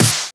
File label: Snares; Vengeance - Essential Clubsounds Vol.4